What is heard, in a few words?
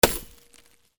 BREAKING